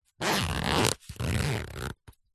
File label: foley luggage bag small fabric zipper slow